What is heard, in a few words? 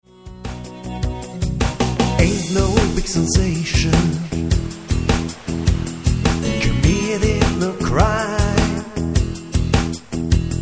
only crying